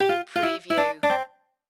Casino Electronic Sound Game Over

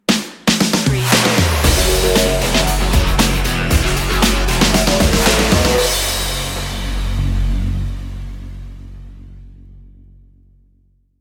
boots of fire stinger

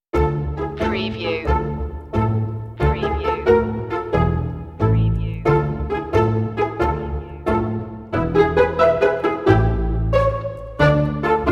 lightly macabre orchestra only

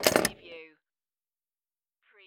Pinball sound, ball hit metal object and move

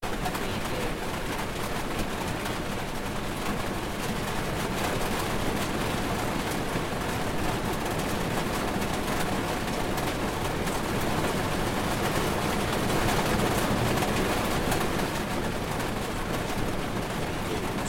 Heavy rain from inside a vehicle; beanstalk audio

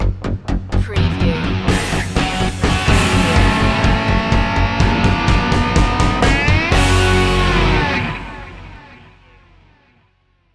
hot wheels sting

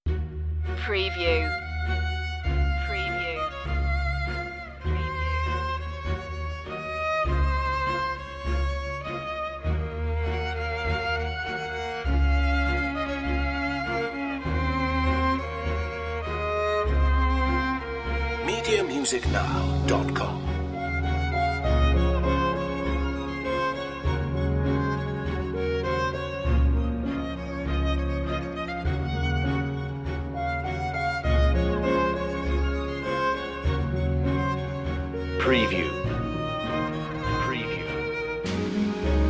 no vocals

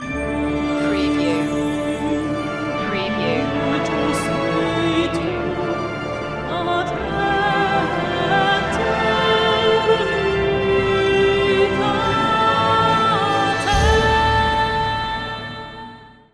no choir

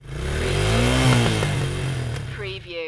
Motorcycle Dirt Bike Rev

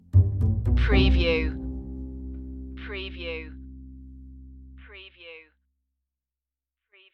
curious case stinger

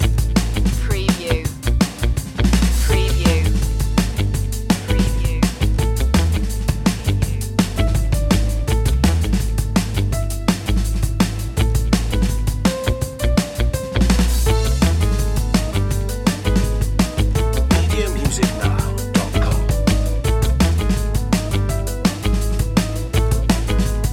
spanish tango music

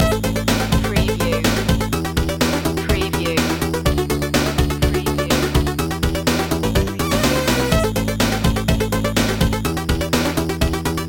retro video game hotwired loop

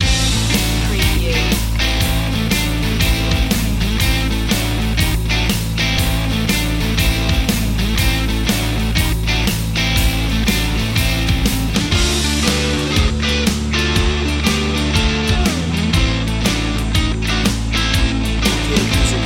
blues

